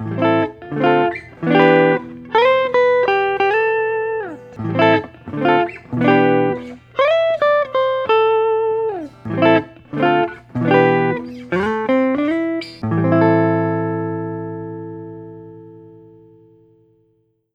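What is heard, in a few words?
Collings Eastside LC deep body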